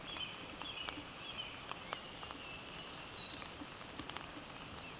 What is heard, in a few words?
Parus ater